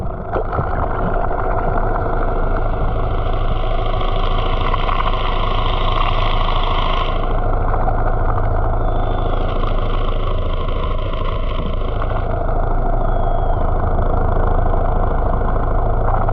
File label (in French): bruit cata voc, profondeur; bruits profondeur